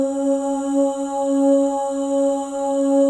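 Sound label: OH, female OHS, Voices; Spectrasonics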